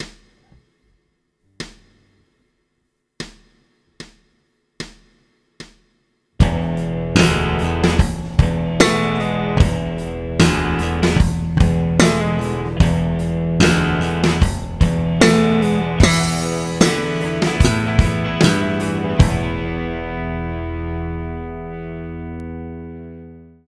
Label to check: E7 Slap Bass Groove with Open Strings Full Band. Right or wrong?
Right